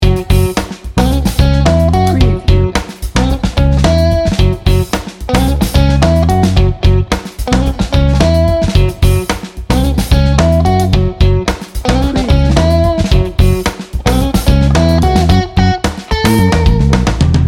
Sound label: funky stumble loop